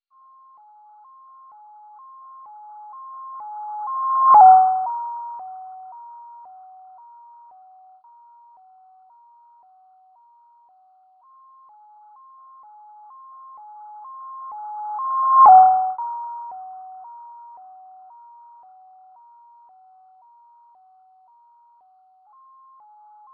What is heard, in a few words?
doppler